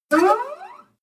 string slide up hit

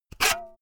saw use short quick

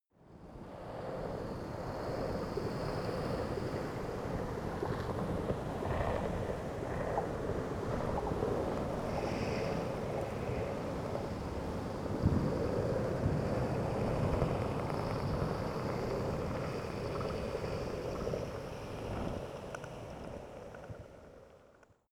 water fx windy

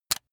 razor rickety fold out hard

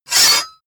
sword cut hard light

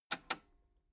metal button cassette stop release

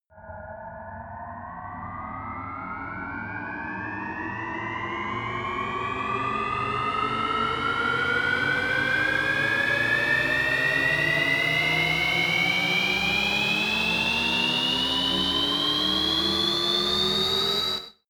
turbine start

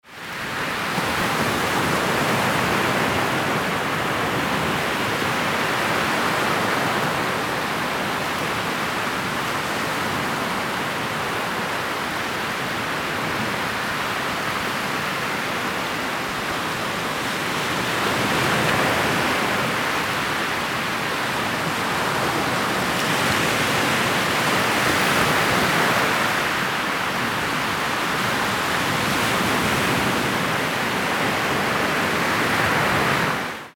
ocean beach

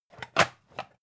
pan stack hard

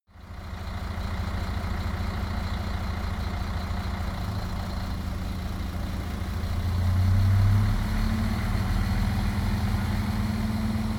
train drive off clatter slow

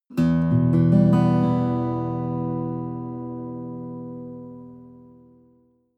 western guitar stroke up slow

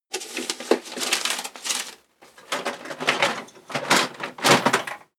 box plastic paper searching

